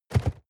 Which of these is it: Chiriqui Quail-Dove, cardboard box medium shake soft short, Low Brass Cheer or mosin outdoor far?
cardboard box medium shake soft short